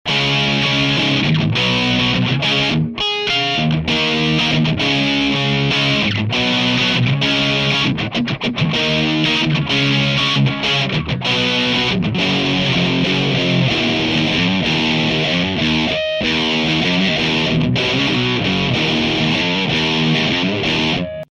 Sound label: SM57 DI